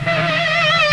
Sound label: GUITAR